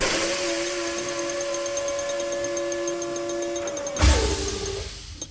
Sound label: garage; ships misc; CosmicRage